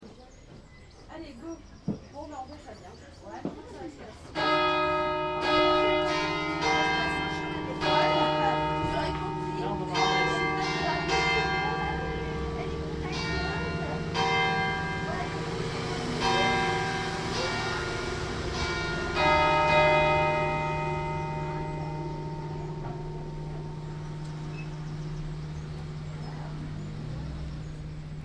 pontrieux cloches

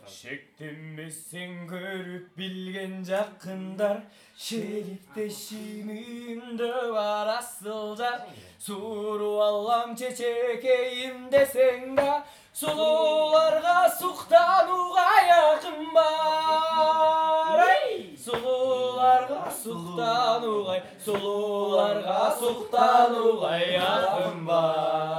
chante

